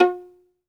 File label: SOLO VI, Synclavier Strings; ILIO